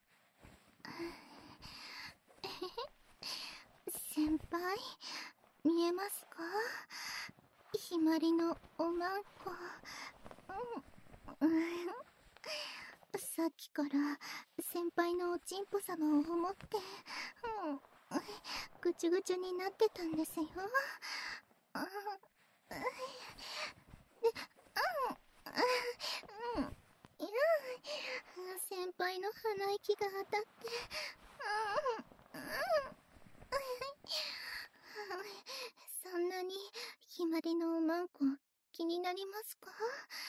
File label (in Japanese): ねっとり耳舐め; ひまりのおまんこ、いっぱいペロペロしてくださいね♪